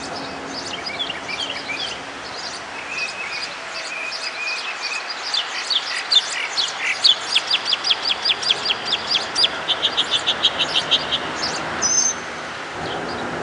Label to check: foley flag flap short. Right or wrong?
Wrong